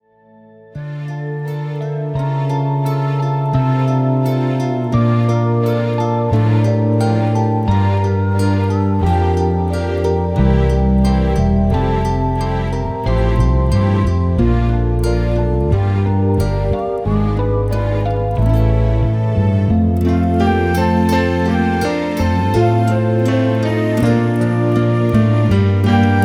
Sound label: Instrumental soundtrack of female singer